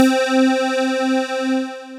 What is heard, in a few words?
Chords, synth keys; Instruments; Life Chords; Ableton; Synth Essentials; Operator